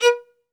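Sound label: solo violin, strings; ILIO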